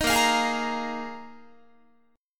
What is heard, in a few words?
Bb 7th Suspended 4th Bb Guitar 4A,4E,5A,5D,5G sharp,6C sharp; strummed